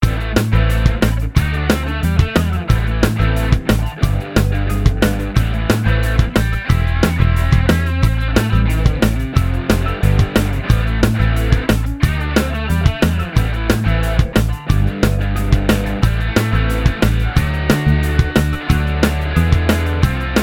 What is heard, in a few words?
Ringtone